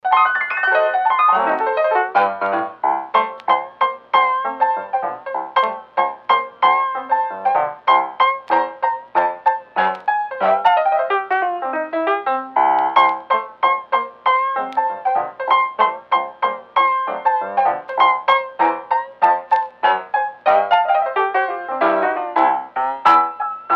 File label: Old Dusty Piano Record